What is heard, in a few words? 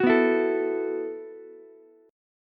E Suspended 2nd Suspended 4th E, Ukulele; strummed; JGuitar